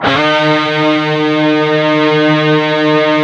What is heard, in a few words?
PRS, Guitars, PWR SLID